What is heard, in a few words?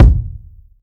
tight bass drum single hit F sharp key, bass drum single hit tuned to the F# note